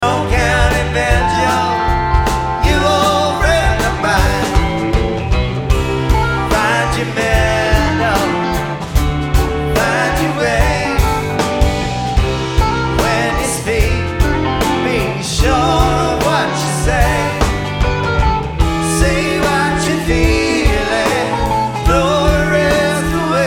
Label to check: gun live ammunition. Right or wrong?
Wrong